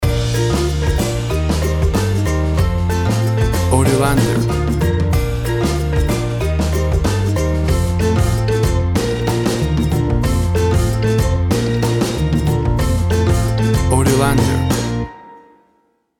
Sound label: Warm Summer Calypso Sunshine Music